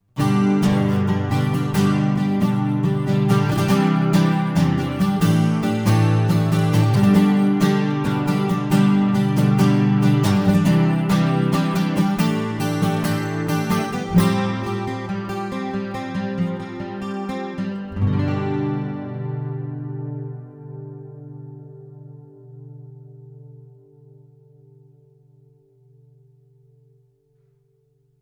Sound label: flatpicking, guitar